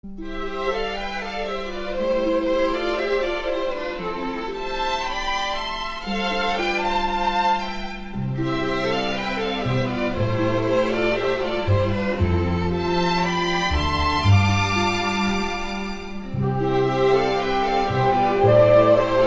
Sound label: Symphonic Pops Standard